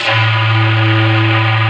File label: lead